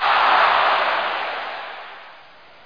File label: miss goal